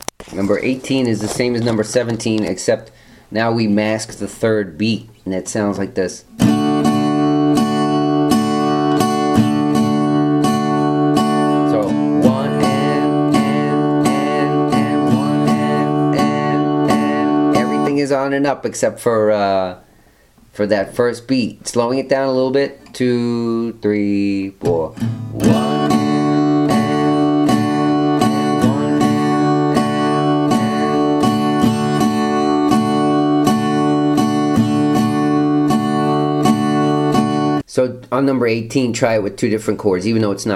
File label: strumming